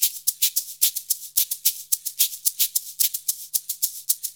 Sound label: 110 MIX, Percussion Loops